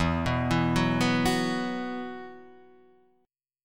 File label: E Suspended 2nd